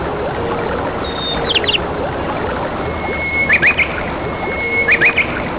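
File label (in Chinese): liushui niao, running water birds; animal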